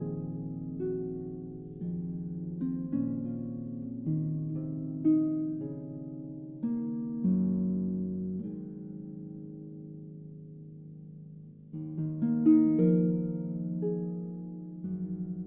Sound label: Harp Concerto Lullaby